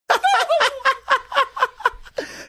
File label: laughing with turkey gobble drop in background